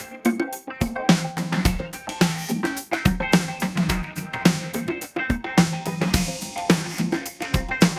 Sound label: funky; La Musica MP3 Generata con l’Intelligenza Artificiale